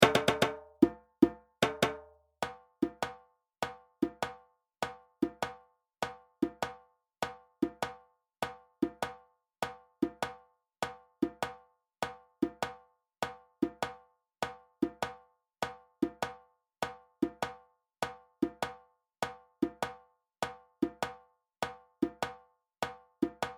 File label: Djembe; Sorsonet